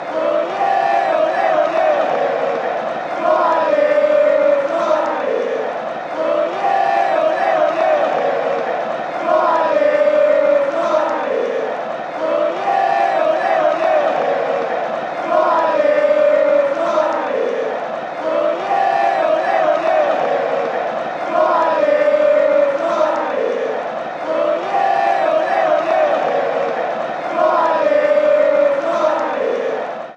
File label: ole